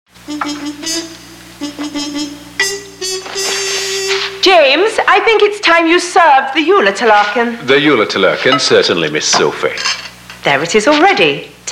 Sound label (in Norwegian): Radio Trompet i start; jordland; JULETALLERKEN